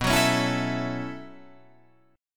B 7th Suspended 2nd Suspended 4th down Guitar Drop D, chord